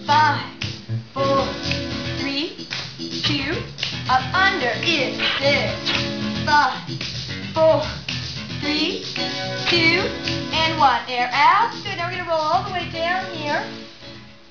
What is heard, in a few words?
aerobics